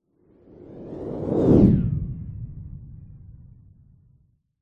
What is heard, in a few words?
science fiction vehicles zoom by fast whoosh